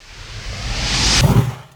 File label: Flame Arrow; Pc Skill; FlyForInsanity